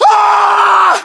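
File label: death, male; english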